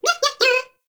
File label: baby vocal singing; Nokko; Warframe; Nokko Colony; Find Nokkos